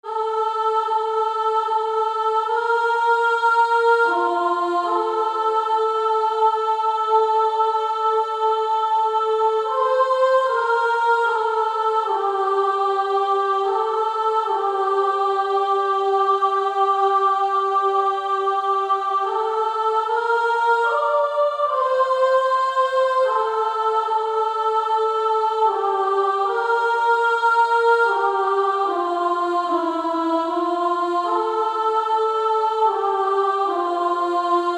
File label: Female Choir